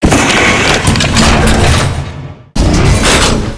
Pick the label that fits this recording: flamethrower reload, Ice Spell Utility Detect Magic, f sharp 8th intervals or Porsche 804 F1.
flamethrower reload